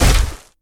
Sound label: step walk